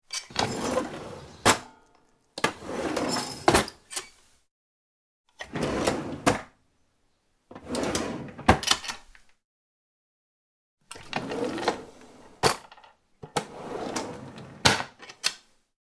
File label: Drawers, Opening and closing drawers; Household